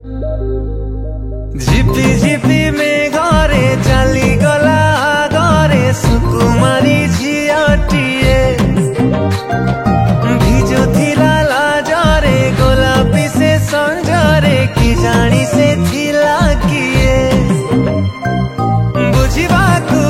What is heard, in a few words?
Odia New Song, Studio Version